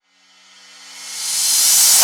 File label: Reverse Cymbals; Vengeance - Essential Clubsounds Vol.5